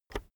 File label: keyboard external enter push soft